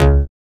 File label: BASS, Synth Bass; SYNTH-Basses; Sound & Vision; Gigapack I CD 2